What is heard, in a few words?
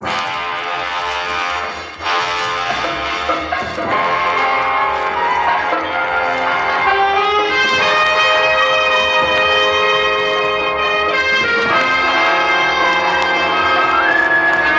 horns, Phonky loops